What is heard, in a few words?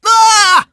falling; sonic; vc